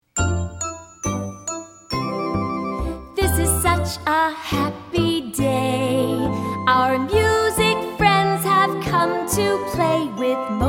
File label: Hello Song